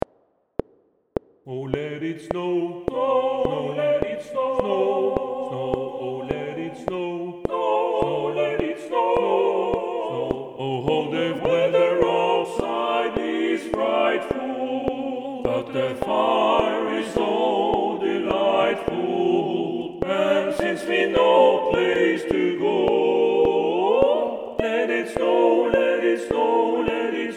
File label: TUTTI CLICK